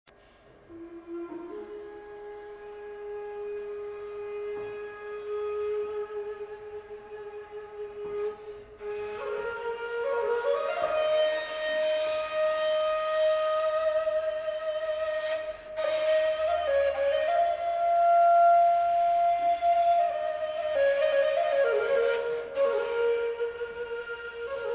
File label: kuray